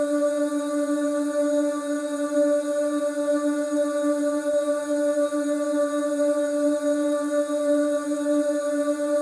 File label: Ethereal Femininity